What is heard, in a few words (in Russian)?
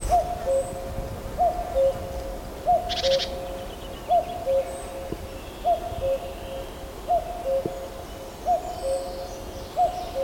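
кукушка записана в лесу; mezhdu brendonom i lejkenhitom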